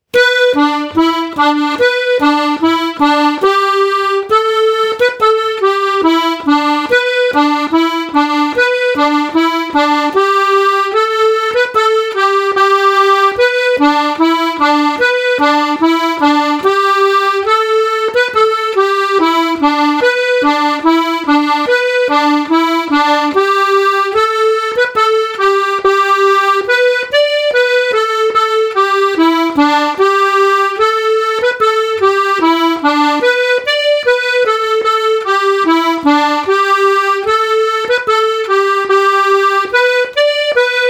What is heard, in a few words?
Polka, button accordion; Kery Polka; absolute beginner button accordion